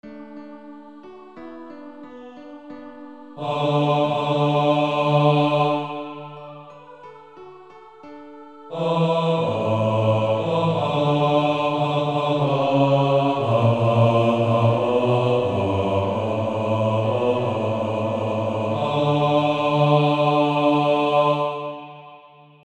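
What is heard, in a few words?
with Piano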